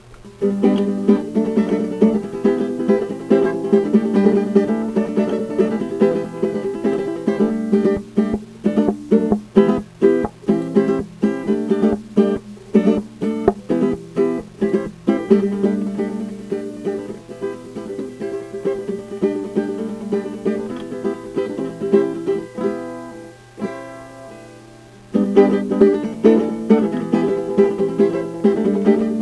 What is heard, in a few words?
Banjo Version